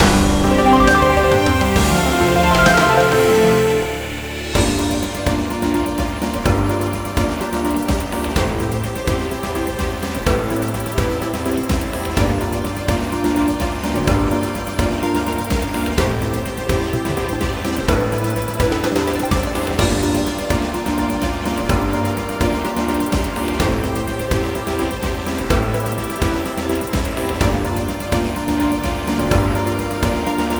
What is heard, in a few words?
Result Lose; Croixleur Sigma; PS Vita PS4 Switch Windows